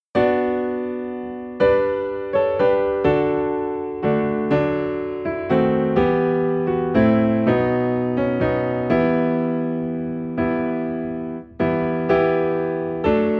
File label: Piano in E Minor; christmas; What Child Is This